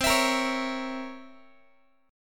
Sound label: C 7th Flat 9th Guitar; strummed; x,20,19,20,17,x; 4A,4E,5A,5D,5G#,6C#; chord; JGuitar